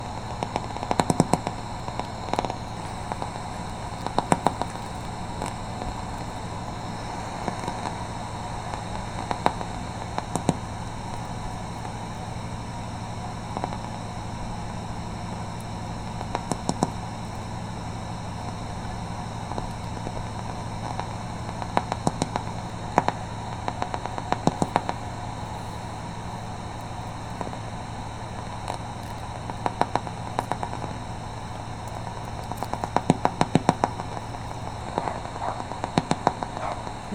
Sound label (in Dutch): bruinvis opname; Roger